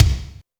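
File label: bass drum, drums; Best Service; Real Mega Drums VOL-1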